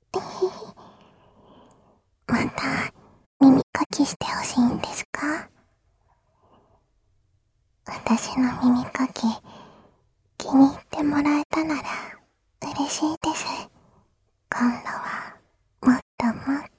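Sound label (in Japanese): 吐息耳かき（効果音あり小さめ）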